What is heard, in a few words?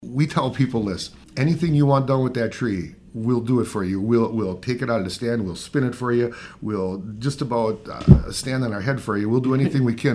Drum